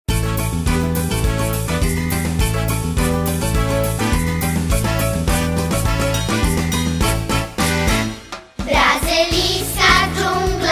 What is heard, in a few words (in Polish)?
przedszkolna samba